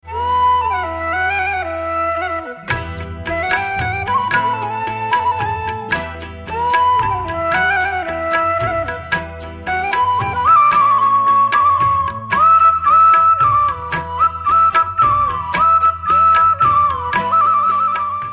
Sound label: Amazing Flute